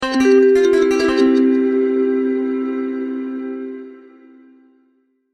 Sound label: ringtone sms; Версия для sms